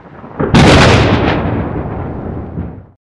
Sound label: Thunder